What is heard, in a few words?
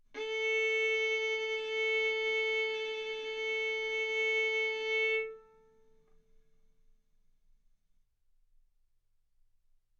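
Violin arco mf A string A4 B4 stereo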